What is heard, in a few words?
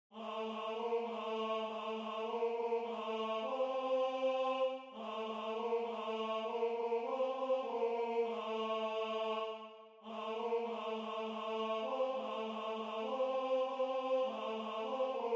Tenor